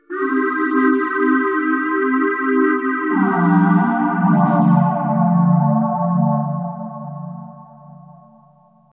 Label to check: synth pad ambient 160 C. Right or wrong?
Right